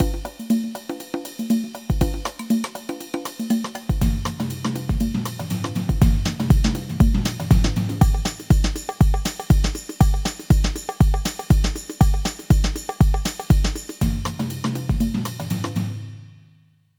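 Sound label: Casio XW-G1 drum sequencer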